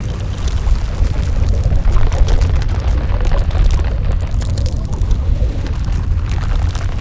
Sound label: Ice Rail Loop